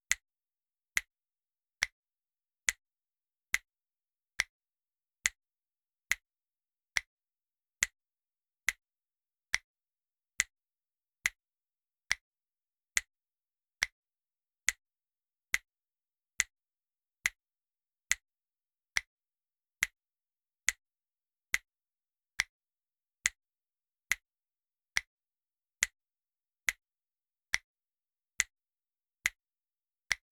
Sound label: Snap